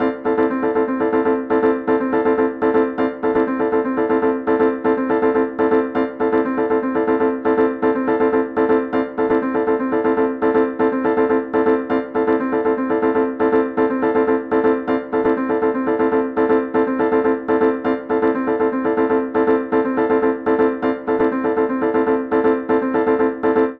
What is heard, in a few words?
Piano Loop Fast; Qua tab PZ LGT32; alarms